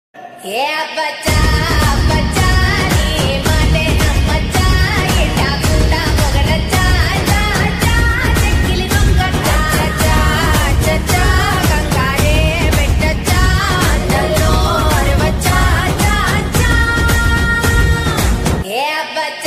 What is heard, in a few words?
TELUGU SONG RINGTONE; MR BACHCHAN